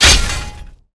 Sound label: metal heavy slice metal